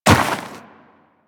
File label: Walk Snow, Footsteps; Warframe; Tenno